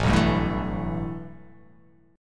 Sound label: A 7th Suspended 2nd